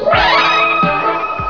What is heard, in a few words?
Ice sound